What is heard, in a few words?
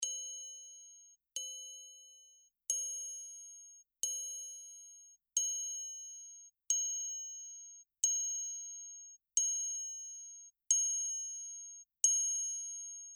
ride